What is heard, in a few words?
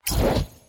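sounds of magic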